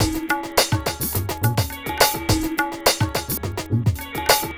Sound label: Indio groove; Sonic Foundry (Sony Creative Software); Ugly Remnants VOL-2